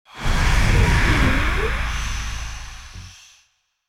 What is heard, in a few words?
Death Card